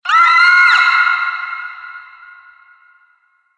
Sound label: Distant Banshee Scream, Monster Sound Effects